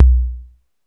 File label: 808 TLOW, Electro Samples; Central Processing Unit